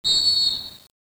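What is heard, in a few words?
start Whistle; pinball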